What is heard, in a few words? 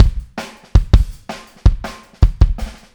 Kick, 162 BPM; Drums; Drums from the Big Room The Mixes; Take Your Toms Off; Verse